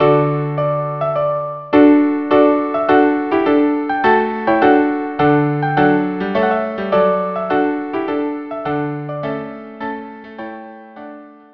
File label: string quartet; Telemann Heldenmusik La Majeste